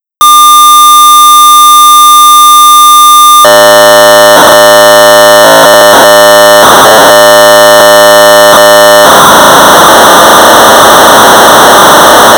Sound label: Sorcerer 1200 baud; Sargon Chess